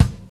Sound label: mid range kick one shot F sharp key, Royality free kick one shot tuned to the F# note; kick drum; kick drum samples